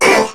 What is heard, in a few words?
Pain; Warframe; Corpus Crewmen; Crewman C; Dialog Barks Battle